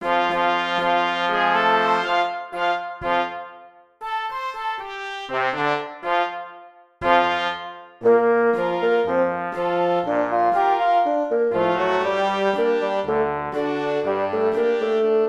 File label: Bassn Sound C